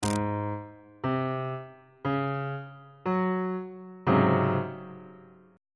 c sharp major 7th suspended 4th 2nd inversion on bass clef